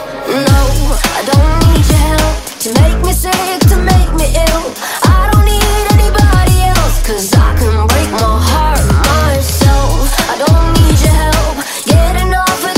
english ringtone english ringtones

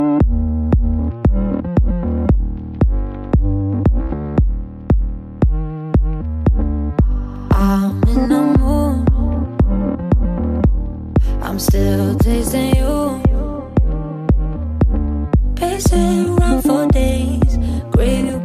best mix english ringtone

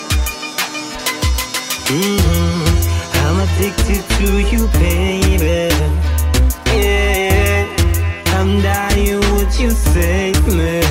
reggae love song ringtone, christian gospel

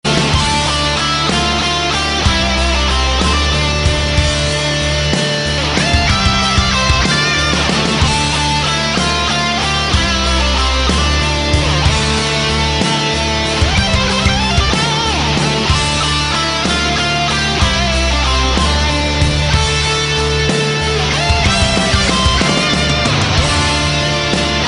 rocking guitar music mobile tone ringtone

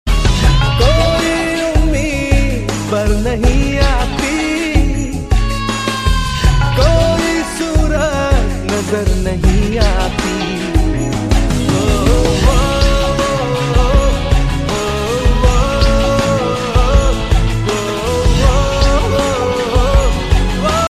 western ringtone

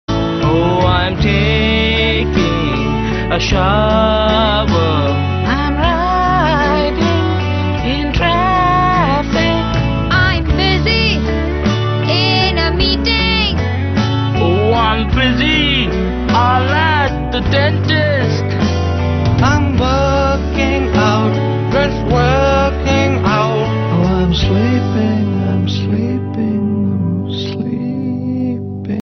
english ringtone

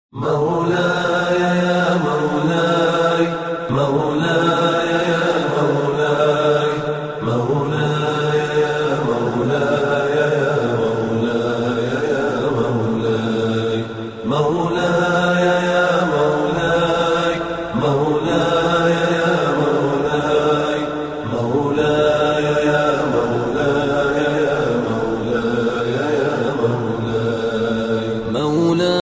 islamic non music ringtone; islamic ringtones

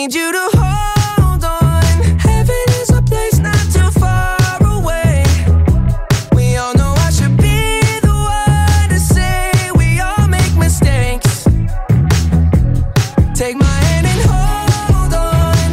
english ringtone

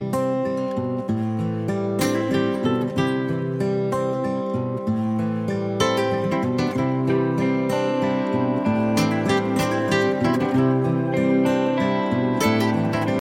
guitar music ringtone

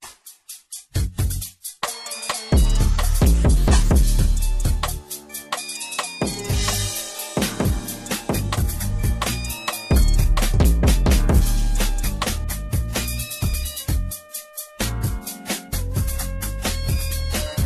nokia ringtone; pexal

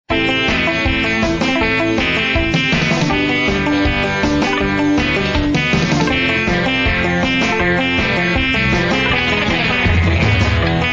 games ringtone

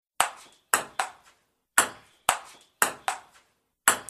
ping pong ringtone

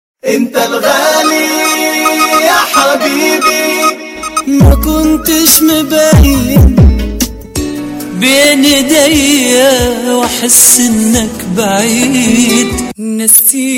arabic mix ringtone

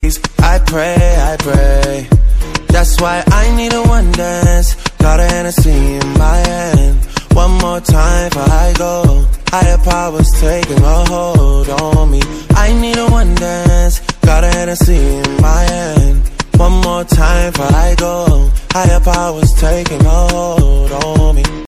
nokia ringtone

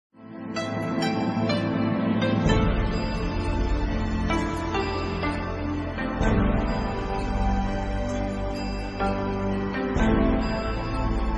sad alone ringtone

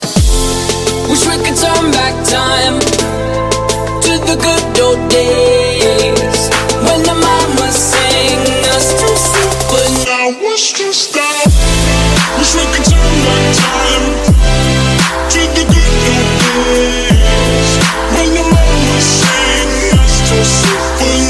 trap ringtone

